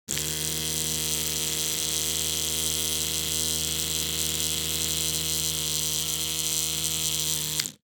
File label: Electric Tooth Brush